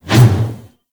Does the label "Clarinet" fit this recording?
No